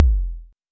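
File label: vermona drm1 mk3 bass drum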